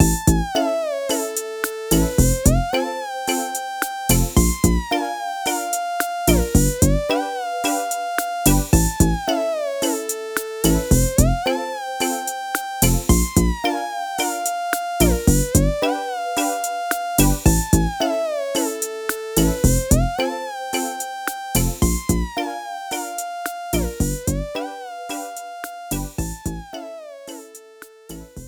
BGM Lose; Clubhouse Games: 51 Worldwide Classics

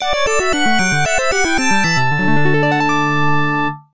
Get Item; mashou denki la valeur; pc-8801; Kogado Logo

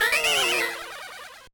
Failure; Wario Land IV

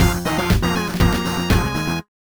Win

